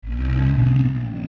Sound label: Like Like Moan